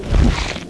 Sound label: CRUNCH; Heroine Iysayana Trilogy